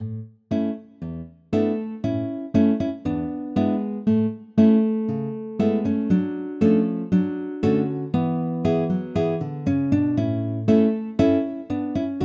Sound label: Gavotte